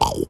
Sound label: crunchy bite